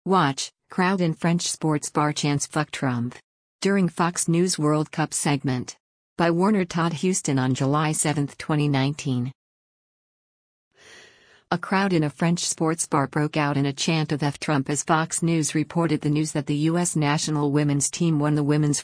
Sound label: crowd in french sports bar chant; Trump during Fox News World Cup segment